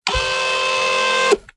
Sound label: Robotic Motor; SoundsCrate